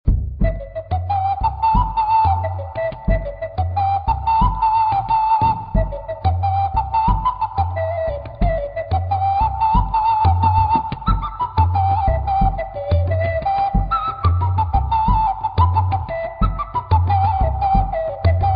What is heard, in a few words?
ocarina sampler